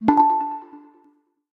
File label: Slipping, notifications; OnePlus 11